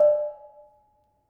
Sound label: Bonang Panerus soft, gamelan